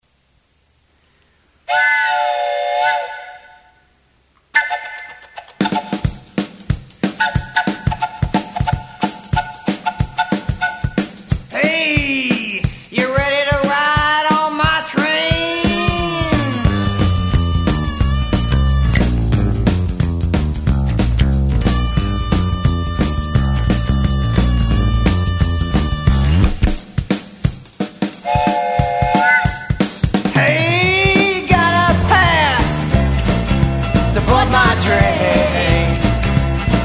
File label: Train Song